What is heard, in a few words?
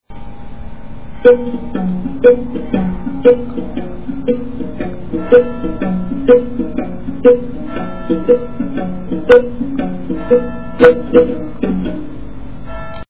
dungu; Etnologia